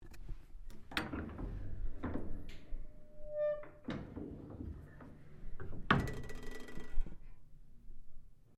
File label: DOOR WATERPROOF OPEN, Sounds onboard HMAS Castlemaine; Boats; Vehicles